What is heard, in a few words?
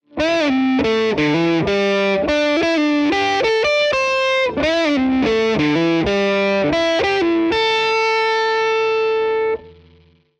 tube solo vintage boost; ampsims; nickcrow